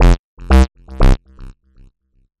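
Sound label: acid house calm close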